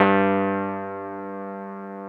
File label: Keyboards Old School, G1; USB Soundscan vol.09; AKAI